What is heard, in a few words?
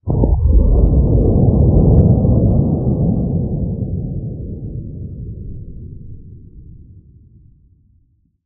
ship explosion; starruler